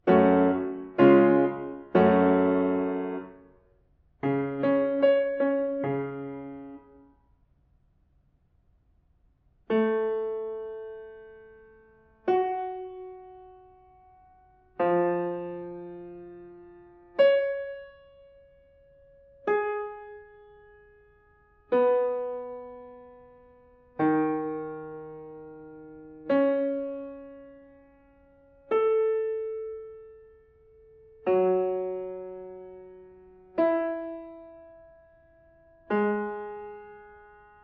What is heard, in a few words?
diatonic collections